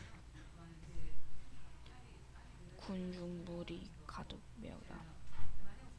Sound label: crowd